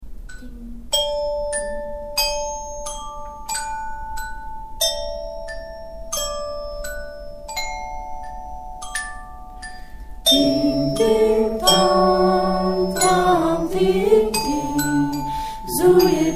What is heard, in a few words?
Ting Ting Tang, chants; unisson; Royaumont